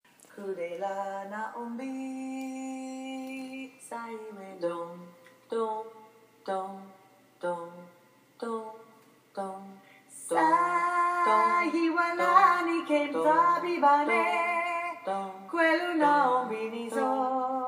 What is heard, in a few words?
bolero alto